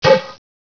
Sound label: blowdart; gearbox; darts